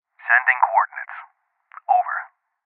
Sniper Pin Point; This Is the Police 2

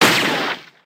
cowboy shot

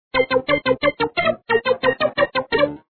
Music Win; SpongeBob SquarePants Delivery Dilemma

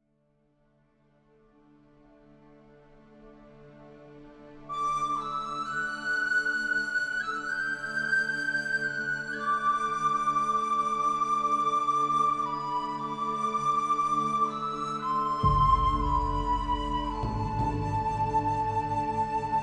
Ambient Idea; The Lost Legends of Redwall; The Scout Act III

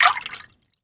Bird Exit Water; angry birds rio

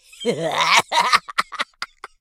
laugh

